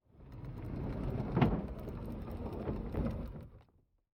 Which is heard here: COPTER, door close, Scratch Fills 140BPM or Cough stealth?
door close